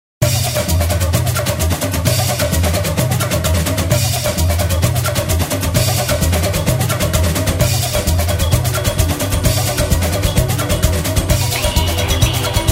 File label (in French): AMBIANCE